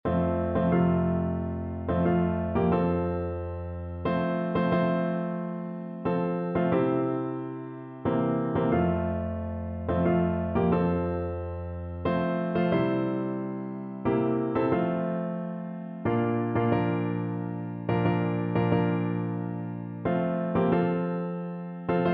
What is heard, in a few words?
joyful sound, piano